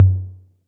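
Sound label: Roland808 low tom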